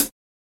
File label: HiHat